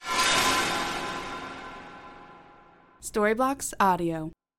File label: stab shocking scary